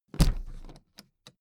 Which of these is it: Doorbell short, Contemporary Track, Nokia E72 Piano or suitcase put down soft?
suitcase put down soft